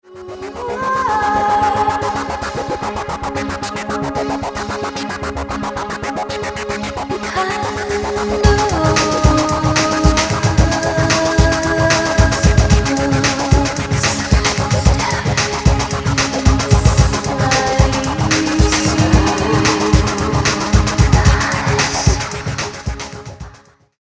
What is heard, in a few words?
distort